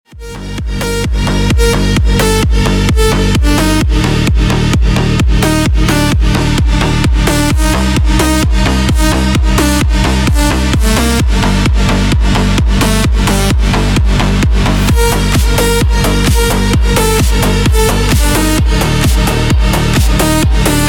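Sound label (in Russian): клубная нарезка минусовка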